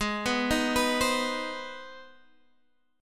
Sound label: C Minor Major 7th Sharp 5th G sharp